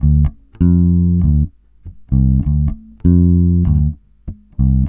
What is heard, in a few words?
SMOOTH 98 D, Bass Grooves; USB Soundscan vol.30; AKAI